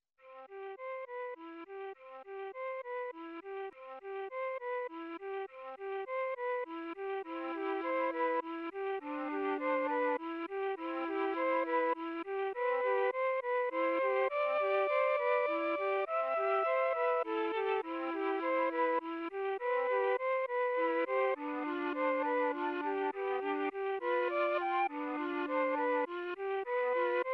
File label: instrumental